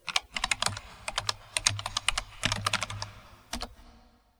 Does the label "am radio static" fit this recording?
No